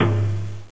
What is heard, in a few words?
wadaiko; Nichibutsu Mahjong Collection; gion